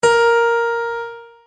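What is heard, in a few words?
A#3 piano; notes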